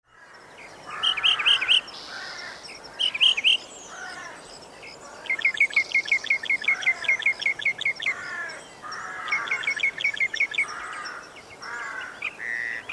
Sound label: Backyard Birds